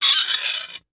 Horror Scary Knife Metal Scraping Metal

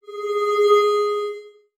Sci-Fi Electronic Radar Sweep; Science Fiction

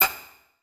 Transition Hit Impact Ping; Adobe; Production Element

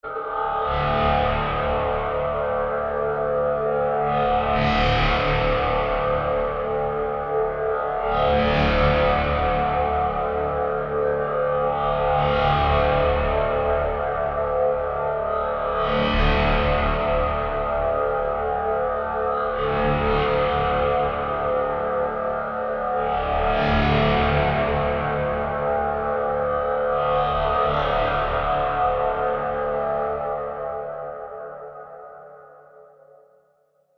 ATMOS, Cinematic Sound Effects; 99Sounds

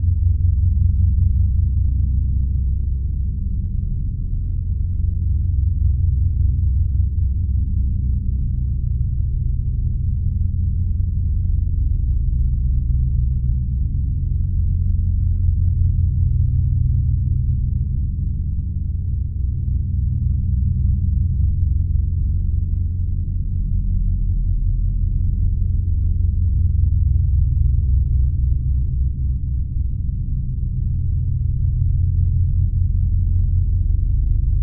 Drone Pounding Deep Rumble Tone; Production Element Imaging; AdobeCC

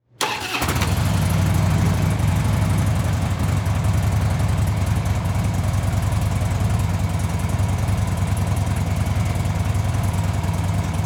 Auto Motorcycle Start Idle Off; Transportation

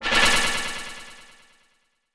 Title Transition Delay Short

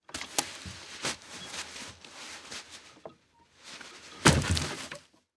Foley Bag Load Grain Sack On Wagon

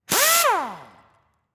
Industry Tool Air Gun Run Short Close

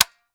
Foley Toy Gun Shotgun Firing

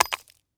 Weapon Grenade Pin Tossed Into Leaves; AdobeCC

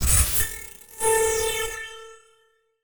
Transition Communication Distortion Scanner; Adobe; Production Element

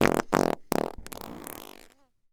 Human Male Fart

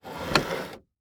Household Wood Door Slide

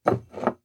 Household Bowl Pick Up

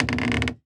Wood Squeak Low; foley

